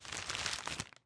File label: Walk